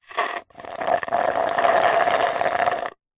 food drink milkshake thick suck dregs straw slurp; zapsplat

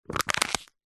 household cup plastic empty squeeze crush; zapsplat

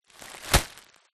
foley plastic bag stuffed with paper impact; zapsplat

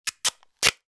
office parcel tape rip from roll

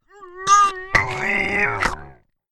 male pain groan strangle suffocate

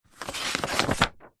household book artists sketch pad page turn

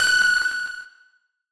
digital sonar ping; sound design; zapsplat

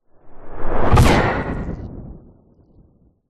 sound design whoosh airy into impact electricity; zapsplat

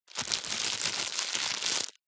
foley plastic pouch small handling; zapsplat

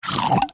cartoon suck tongue mouth designed; zapsplat

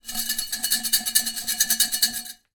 food raw dry spaghetti shake in glass jar

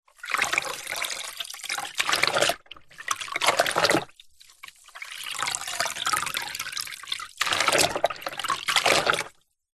mop into plastic bucket water rinse splosh; household

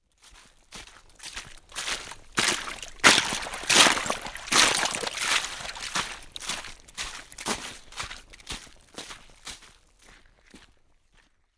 footsteps walking through icy puddle woods; foley; zapsplat

